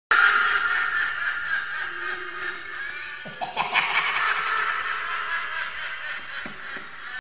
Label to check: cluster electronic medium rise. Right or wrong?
Wrong